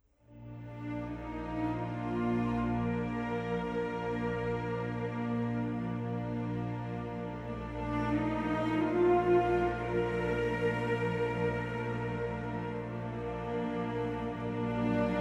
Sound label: Strings Chorale; Werner Pirchner; Sondeckis; Abschied